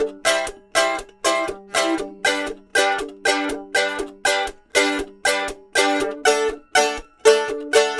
Mandolin Chuffs; Continental Drift Aftershocks

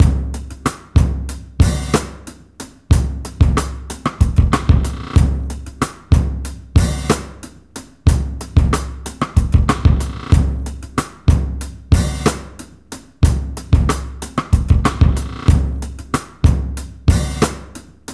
93 bpm, Breaks; BETSY'S BOUNCE; 90 s Sample CDs; Planet of the Breaks

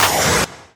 Misc Short FX

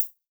HH, HIHAT

